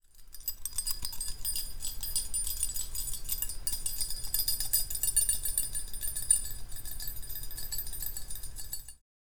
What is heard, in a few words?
Shaking Keys